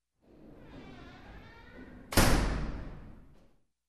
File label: Creaky Door close slam